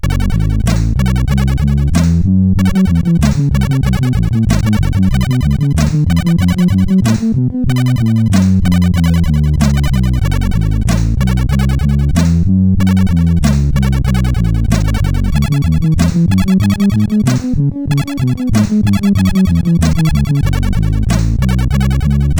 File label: Castle Blues, CSG8580R5